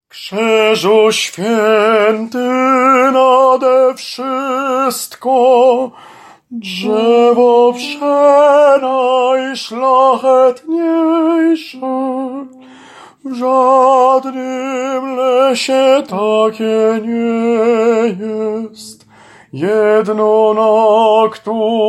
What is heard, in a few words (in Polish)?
Krzyzu swiety TENOR